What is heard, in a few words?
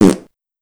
Fart